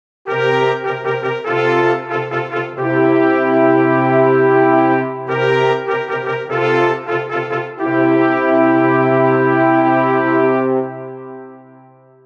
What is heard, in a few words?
fannfare